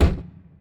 bank doors arrive

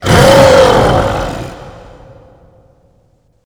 shout long; glasgavelen

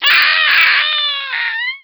die, zombies

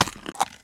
foot; spider

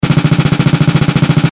shooting, helicopter vehicle

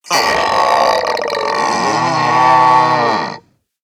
idle, zomb female; nmr

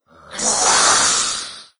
idle, maggot; doom3

